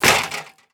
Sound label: Metal Destroyed; Angry Birds Rio